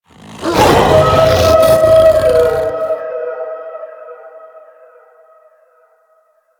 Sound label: Alerted Howl B; Enemies; Vocals; Warframe; The New War; Archon; Amar